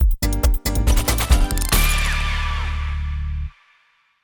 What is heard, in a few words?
Plastic Bags